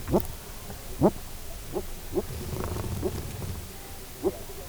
Serrasalmus compressus cf, Amazon, soniferous; Amazon Fishes

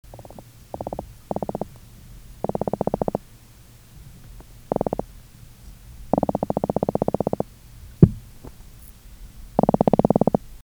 Croaking croaker; soniferous

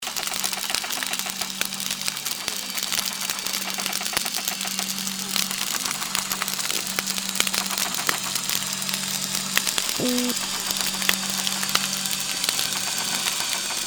boat, Florida; soniferous; Florida 2019

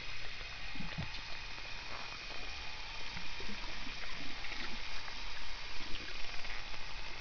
alarm like

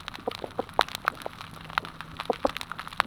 chicken; soniferous; Gilligans Island

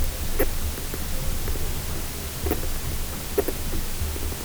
Trachelyopterus galeatus, Amazon Fishes

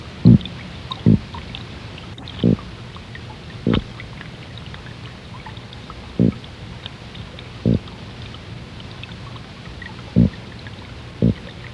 holocentrus ascensionis; squirrelfish; Recorded at Bimini

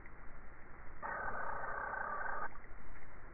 possible mechanical

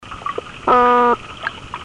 Love call of the oyster toadfish; soniferous

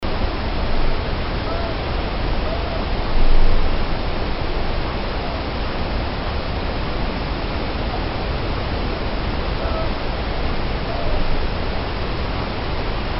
500hz cry series

